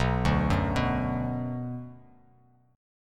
B Minor B Bass Standard; arpeggiated; JGuitar

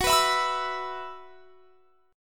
Gb 7th Suspended 4th Gb down, chord; Gb7sus4 strummed

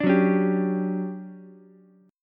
D Major 11th Ukulele C6; strummed

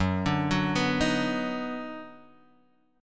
D sharp Minor Major 7th Sharp 5th F sharp

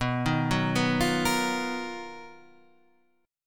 B Major Sharp 11th, Guitar, Standard; BM#11; arpeggiated; JGuitar

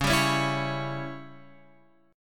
Db Diminished Db down Guitar Open F; strummed; JGuitar

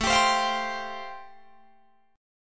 A Minor 7th Sharp 5th A, Guitar, DADGAD; Am7#5; strummed; chordshape

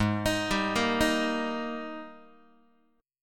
Ab Major 7th Suspended 2nd Ab down Guitar; arpeggiated; JGuitar

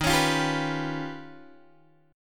D sharp Augmented 9th D sharp Guitar Open G

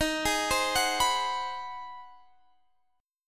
G sharp 7th Sharp 9th D sharp

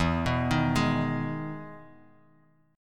E Major 7th E down Guitar Open D

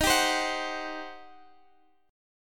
G Major 7th Suspended 4th Sharp 5th, D sharp

